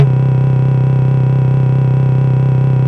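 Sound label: Bell Organ C3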